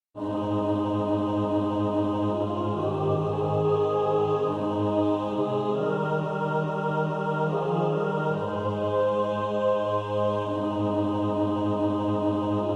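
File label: mixed, practice music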